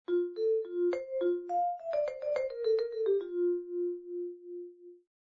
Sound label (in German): Vibraphon; Instrumentenkunde